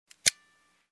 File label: Construction